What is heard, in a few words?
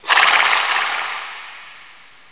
snort, famine; Hexen II